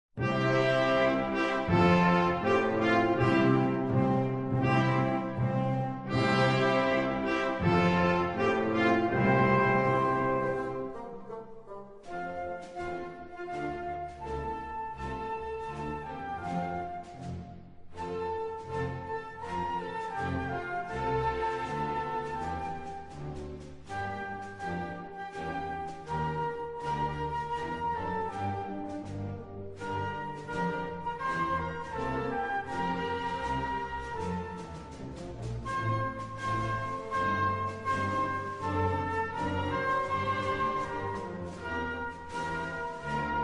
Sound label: anthem